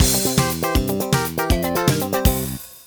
Excellent Building; City Bloxx

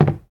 Wood soft; Devour

